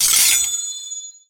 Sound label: sheathing sword